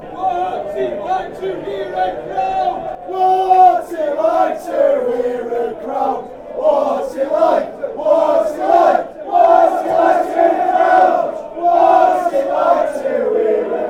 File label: whats it hear crowd, fan chants